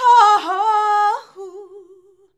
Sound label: "HAHAHAUHU", FEMALES; Best Service; Hyper Dance